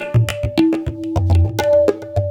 TABLAS; Zero-G; Chemical Beats

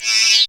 syn, Dance Synths, Trancers; Trancers 1

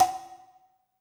amb ind; Drums In Your FACE!

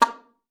SLPA A#3, ALTOSAX; Xsample VOL-2

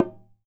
DHOL DRUM; AKAI S6000; ETH PERC; WorldSounds

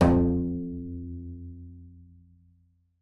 BING F2, BASS FINGER; Xsample VOL-5

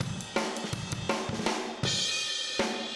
Fill Room, Drums, 162 BPM; Drums from the Big Room The Mixes; Take Your Toms Off

